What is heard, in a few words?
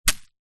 foley bark chippings handful throw onto dirt